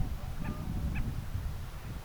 liejukanako

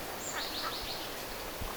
onko rakattirastaslintu

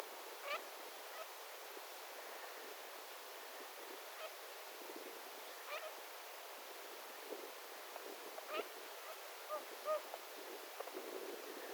tavien aanta tietaakseni

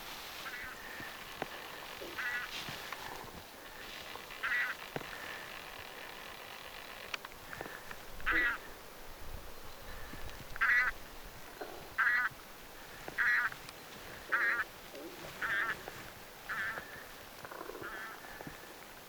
fabalis-metsahanhen aantelya

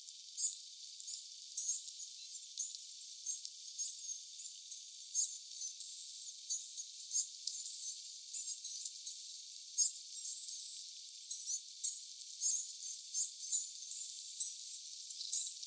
tuollaista punatulkkujen aantelya

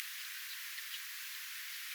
namakin ovat ilmeisesti sen ilmeisen carpodacuslajin aania

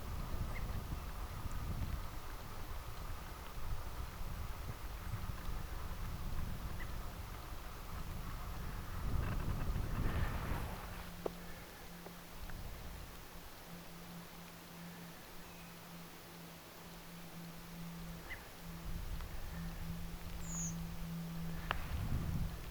punakylkirastas saaressa; Saaressa oli yhä punakylkirastas.

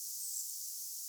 tuollainen rastas jonka siritysaani on melkein kuin vihellys

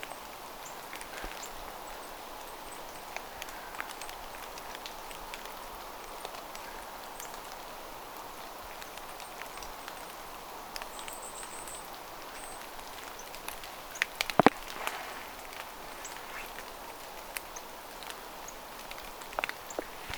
ilmeinen pahkinanakkeli pikkulintuparvessa; pähkinänakkelin ääniä; Samanlaisia kuin toissatalvena, kun se pähkinänakkeli kävi siellä ruokinnalla.

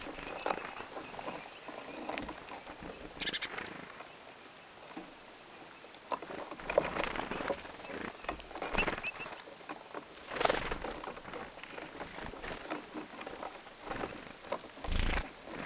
vähän punatulkkuparven hiljaisia ääniä

